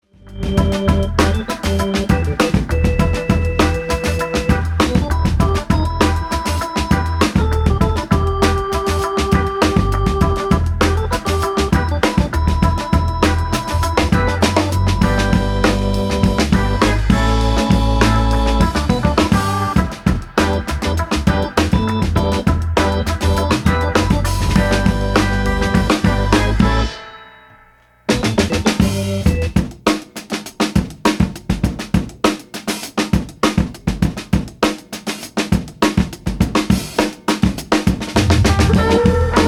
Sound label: Organ Trio